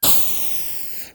Machine, Retro Games Sound FX

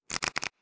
cards shuffle

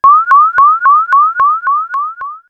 Uniscanner, Retro Games Sound FX

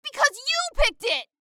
goof

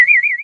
Uniscanner, Retro Games Sound FX

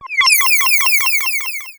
UFO, Retro Games Sound FX

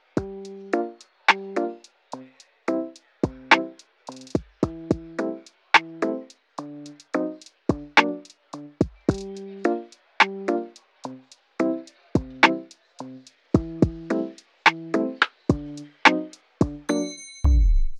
Lofi Music Intro Stinger; Kawaii Love Lofi Music Pack 2

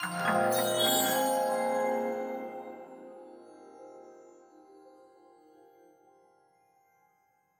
levelup

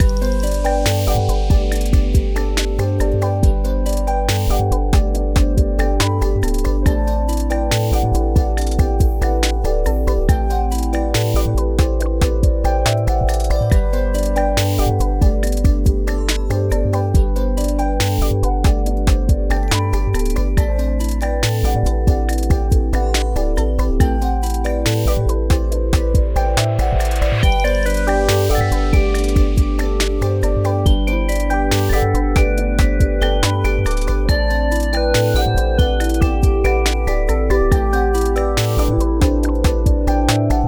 Lofi Music Loop; Kawaii Love Lofi Music Pack 2

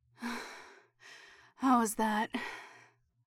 suck

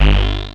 Robot, Retro Games Sound FX

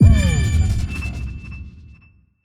Lose A Life, Music Stingers and Jingles; Kawaii Love Lofi Music Pack 2

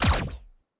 Blowback, Combat

